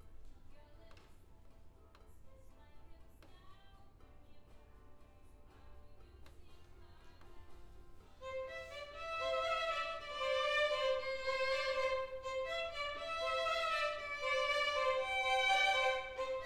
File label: Cello